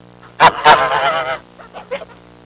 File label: Goose call